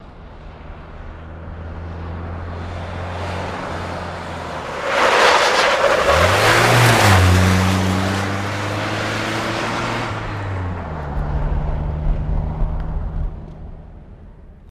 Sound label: Jeep Chrke 84 Skid Out; jeep cherokee 84